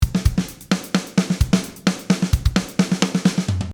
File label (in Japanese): drumフィル